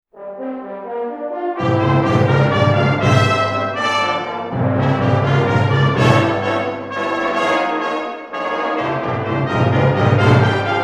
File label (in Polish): fanfary